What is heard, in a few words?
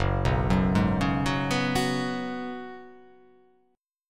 F sharp Suspended 2nd Suspended 4th